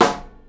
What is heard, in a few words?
SNARE, Live Snares